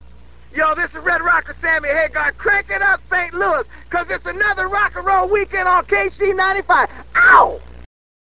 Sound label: bumper